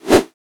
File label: Whoosh; Whooshes